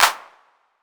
808 CLP; Wizoo - Platinum 24 Electronic; 808 SOFT P2

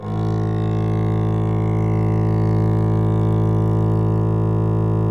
DBL BASS, Synclavier Strings; ILIO

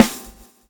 SWNG

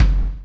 CHAIN; Spectrasonics - Backbeat

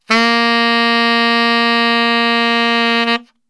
A#1 SAX STR, Brass; Best Service; Brass Super Section; SAX STRGHT

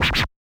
SCR, Yamaha DJX 2B

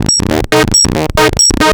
138 D#, hi-tone-synt, Techno; instrument-loops

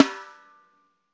LOFI, LO-FI KITS

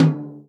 tom, Real Drum Kit, jungle kit

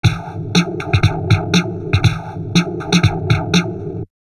DIST, Drum Loops Crazy Processed

